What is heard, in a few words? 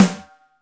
CHROME HI, SNARES; Back In Time Records; Big Bang